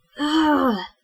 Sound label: Aurgh